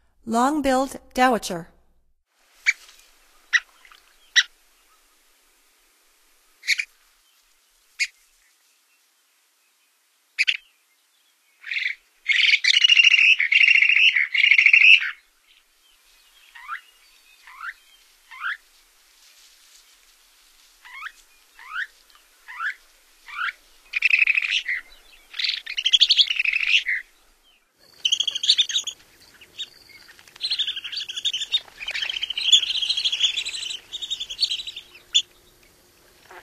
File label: Long-billed Dowitcher; North America; Stokes